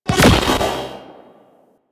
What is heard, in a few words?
Barrage Fire; Warframe; DE; Sentients; Ortholyst